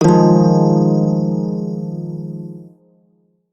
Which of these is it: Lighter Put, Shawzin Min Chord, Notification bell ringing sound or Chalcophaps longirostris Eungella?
Shawzin Min Chord